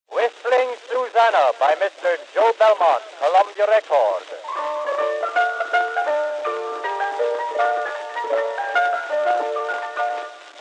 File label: Whistling Susanna Coon Song